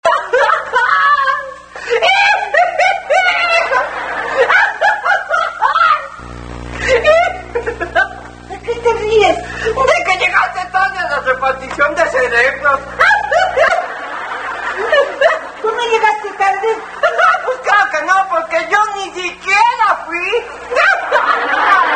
sonidos efectos especiales, risas; chespirito; quico

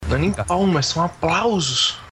aplausos

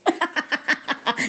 risa brujil

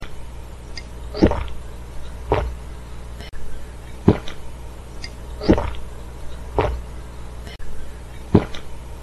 efecto de sonido trago

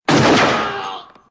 disparo

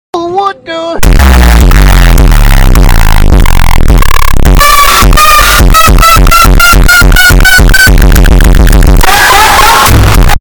sonido bajooo

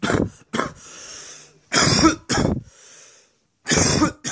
tos

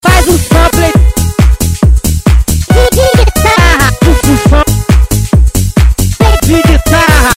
guitarra

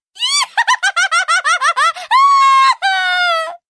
risa

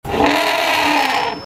grito de elefante

